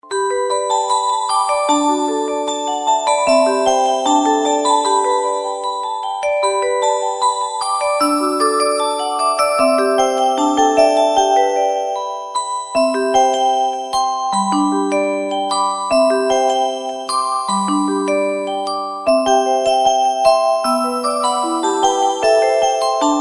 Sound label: musicbox happy day